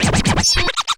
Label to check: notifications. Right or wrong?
Right